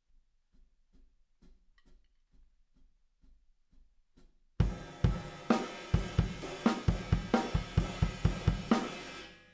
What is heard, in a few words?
Room